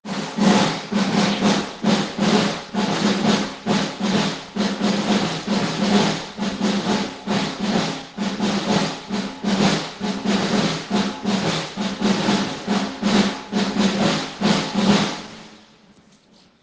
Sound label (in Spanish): Tambores Semana Santa